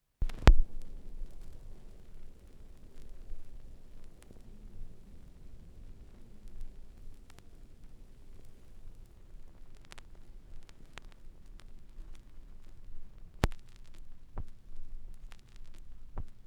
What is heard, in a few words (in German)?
schallplattengeraeusche